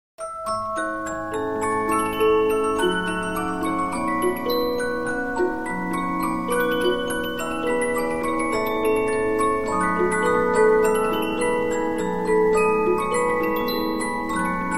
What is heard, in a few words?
Polyphon, 15inch disc musicbox